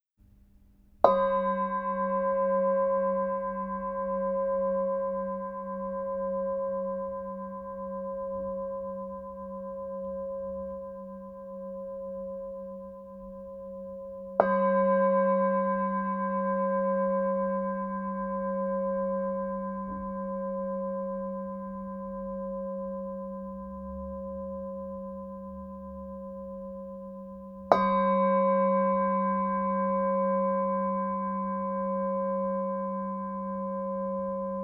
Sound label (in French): Grand bol nepalais